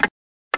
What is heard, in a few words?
Clock; druiddem